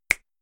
Finger Snap

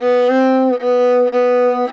Violin Bit

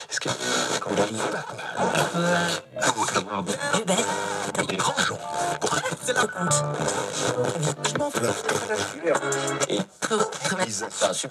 Radio French Fm Stations Scan Tuning

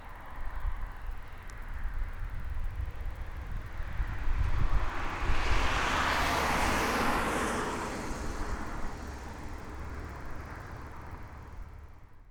Car Moving Wet Road

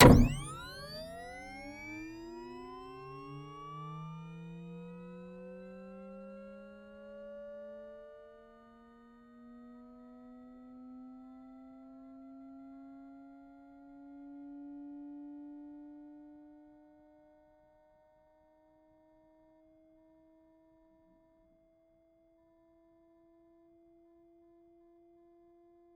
Flash Sound Dry

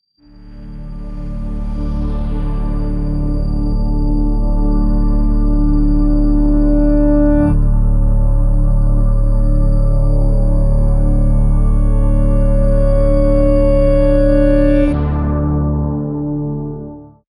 Long D Swell

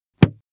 Closing Book

